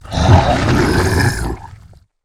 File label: Attack